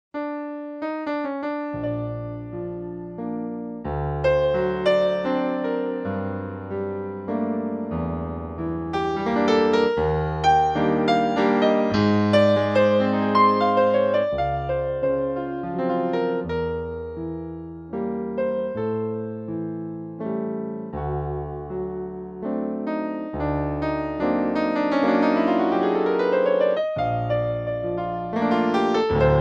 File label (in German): walzer